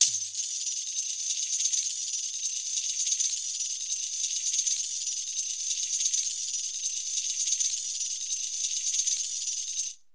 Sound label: TAMBOUR K, Ragga & Dub Reggae